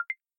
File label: ding